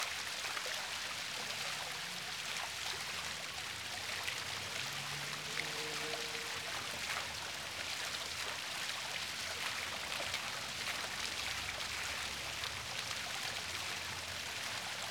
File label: Fountain